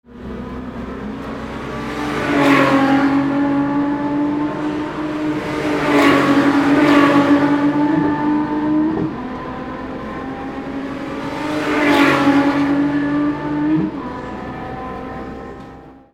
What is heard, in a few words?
beetle